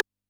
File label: rim, Yamaha DD-65